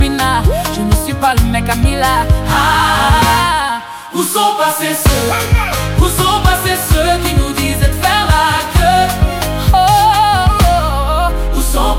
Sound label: CHOIR, Gospel